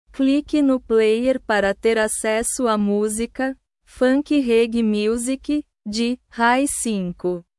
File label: funky reggae music